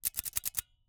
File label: Icecream Scoop, metal, mechanical, teeth, gear, squeeze, release, triple; Eiravaein Works; Vaeyan IV